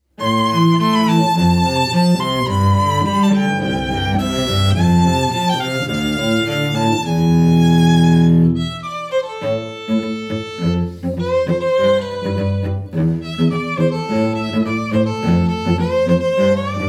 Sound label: quartet